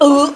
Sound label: uuhg